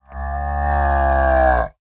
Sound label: animals cow moo; zapsplat